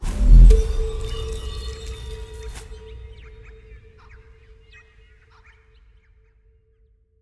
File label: Jingles; Semblance; AREA BACK TO LIFE